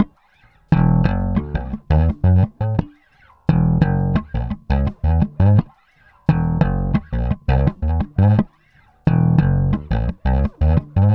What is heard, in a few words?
Bass, RnB